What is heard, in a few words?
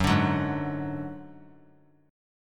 Gb Suspended 2nd Sharp 5th 3C,3F,3A,3D; Gbsus2#5 strummed